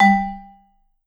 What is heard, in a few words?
G2, Vibes; Sampleheads; Dave Samuels; Marimba & Vibes